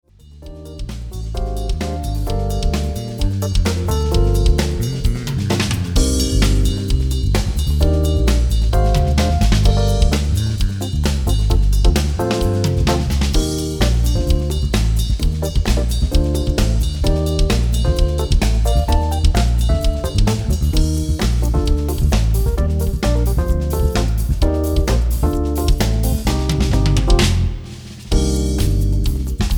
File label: sudden samba